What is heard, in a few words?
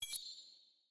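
sfx voicechat notification join; premade voice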